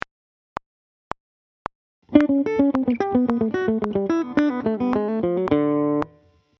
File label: Hybrid Picking